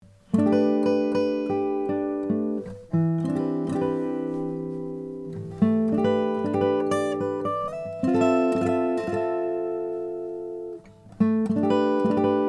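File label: lowden honduran rosewood; pierre bensusan signature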